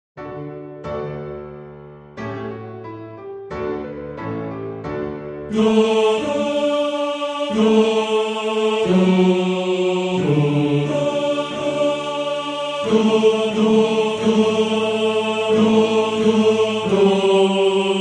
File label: Tenor; Die Ehre Gottes; Tenor 2